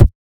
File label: kick; base pack; drums